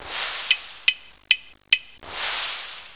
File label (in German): Sound Baum R